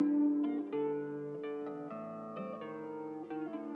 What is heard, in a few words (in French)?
guitare